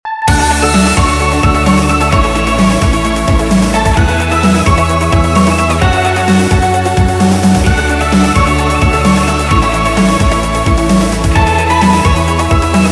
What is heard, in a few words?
poprock, ringtones